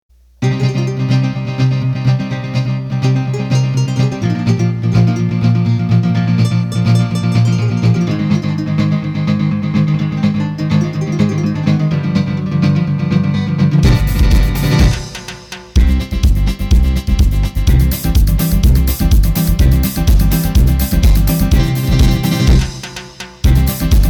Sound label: Gipsy, accordion